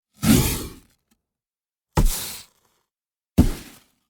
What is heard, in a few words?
gun powder ignite